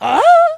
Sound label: Ahh; Madame Couture: "Ahh..!"